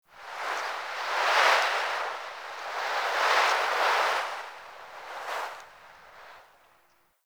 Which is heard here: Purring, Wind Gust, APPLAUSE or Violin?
Wind Gust